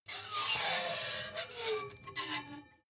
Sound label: metal screech phaser edgy short